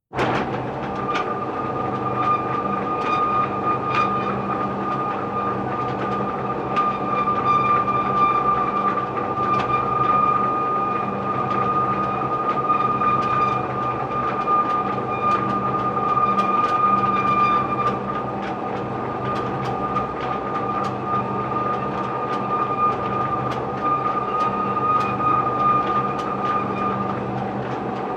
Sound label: Industrial Mechanical Squeakey Old Grain Conveyor Start Run Stop; Conveyor Belt Sound Effects